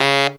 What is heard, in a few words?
TENOR C#; Zero-G; Phantom Horns